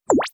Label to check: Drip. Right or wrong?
Right